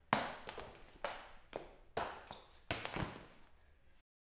Person Falling

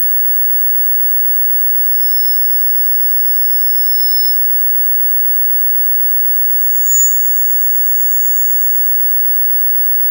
Whine

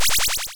Squeaking Target Hit

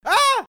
Death Scream

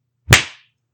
Wet Thwack

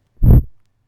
Air Churp

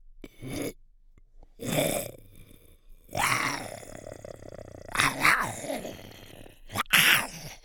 Monster Growl

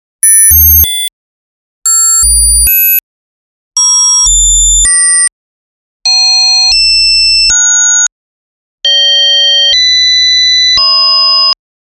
Annoyingly Loud Bleeps

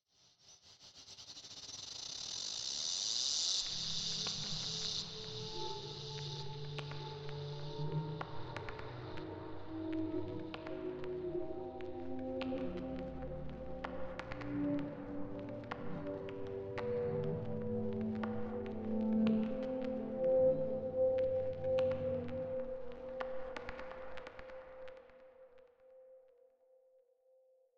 Reverse Harp

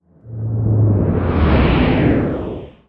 Large Spaceship Pass

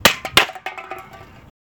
Kicking Can

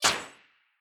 Firecracker Snap Pop Small Explosion

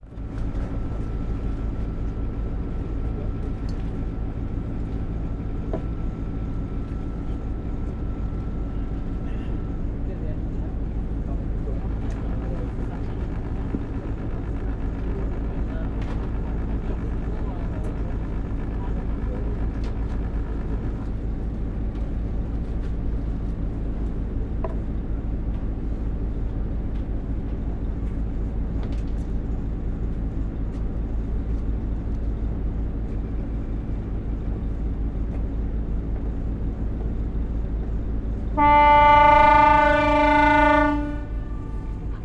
On Boat Engine Horn

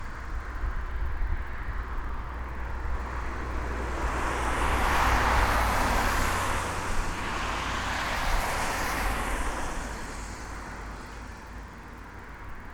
Cars Moving Wet Road

